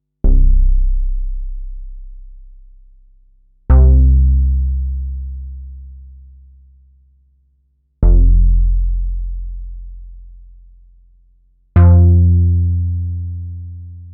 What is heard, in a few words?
DEEP SEQ BASS Moog Model 15 iOS